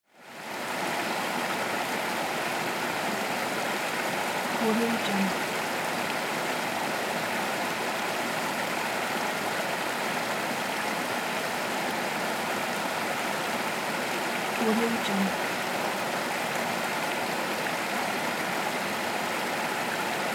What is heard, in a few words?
forest stream small waterfall